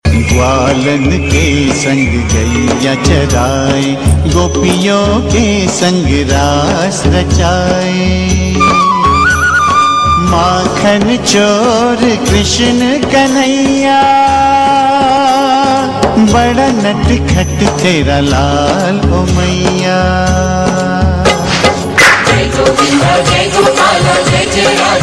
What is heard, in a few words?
krishna tone, ringtone